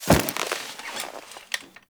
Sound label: Classroom Chair Sit; Ambience; Warframe; TheNewWar; Zariman